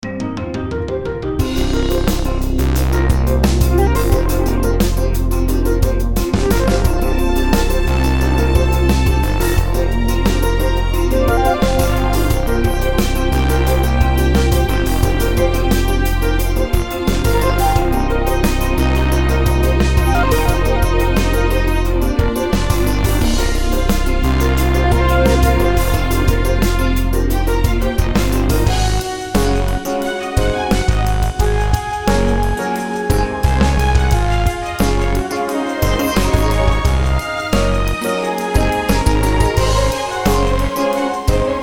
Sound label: 88BPM; Elements V4